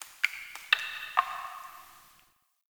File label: Drip, Ambience; Warframe; TheNewWar; Zariman